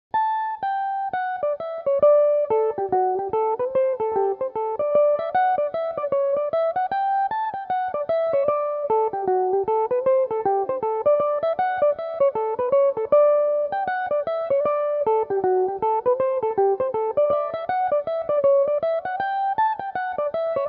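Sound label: reel d major, mandolin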